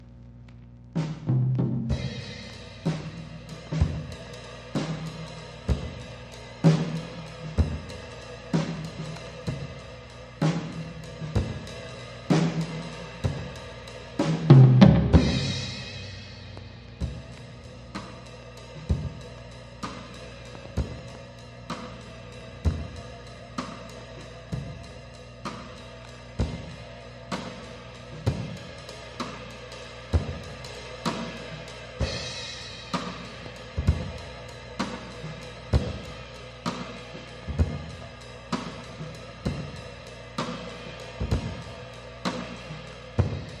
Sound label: Drums